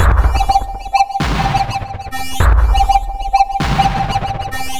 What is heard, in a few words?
drloop, drumloops, Vinyl Attack; Masterbits